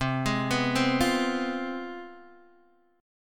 C Major 7th Suspended 4th Sharp 5th, Guitar, DADGAD